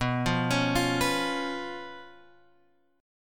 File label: B Suspended 2nd Flat 5th, Guitar, Open G